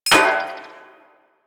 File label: Flame Spear Mech; Grineer